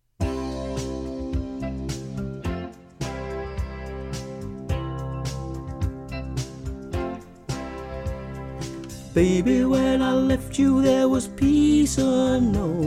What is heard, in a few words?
Low Women; Choir; Harmonies